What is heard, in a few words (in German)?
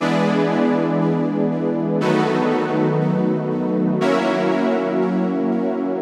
D-Dur Nebenakkorde